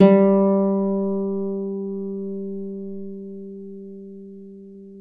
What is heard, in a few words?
Celtic Harp; E-MU Producer Series Vol. 7 – Old World Instruments